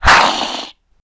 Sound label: ree, sfx; corrupted mode